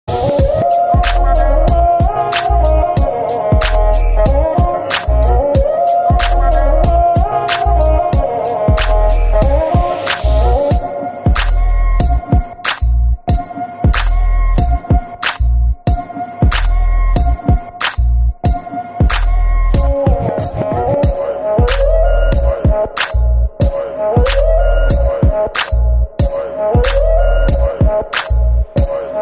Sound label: Rajasthani Flow Music Street Ringtone